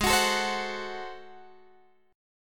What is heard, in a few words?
Ab 7th Flat 9th down Guitar Open G